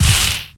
Ranged Magical Medium; Kilta

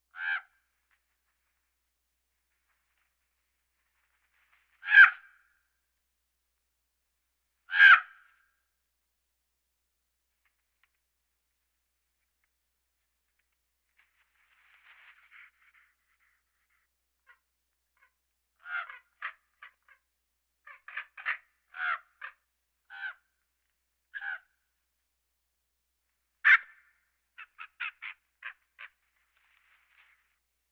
Birds

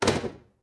Door Shut; blindside

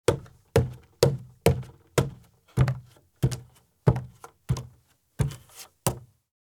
Bare Feet Walk up Steps and Across Wood Porch; Sound Ideas; Footstep Sound Effects

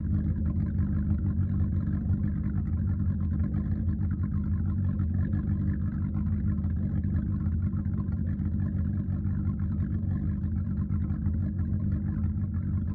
Sea Inside

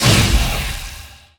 Pickup Scifi Energy; SFX Kit

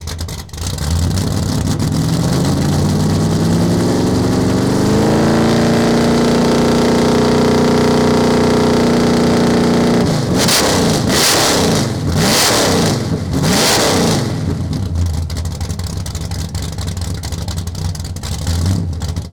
Mustang GT350 Fast Idle Rev; Automobiles

